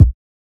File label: kicks